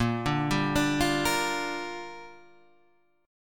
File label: A sharp 6th, Guitar Drop D